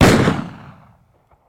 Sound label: Gun, Hand, 44 Magnum, Single Shot; gun and weapon sounds; captain audio; fully loaded